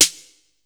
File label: SN, Casio CTK-5000